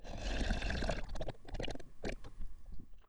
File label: slurping shoggoth, monster